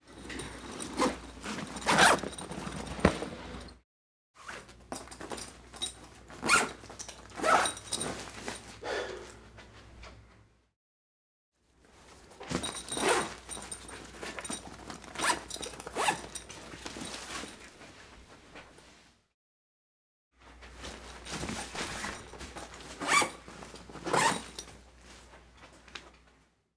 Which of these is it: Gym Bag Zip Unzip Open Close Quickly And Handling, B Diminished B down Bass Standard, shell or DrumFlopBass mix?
Gym Bag Zip Unzip Open Close Quickly And Handling